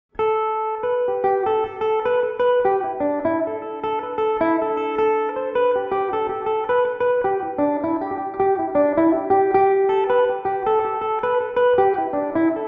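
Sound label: G major, Irish mandolin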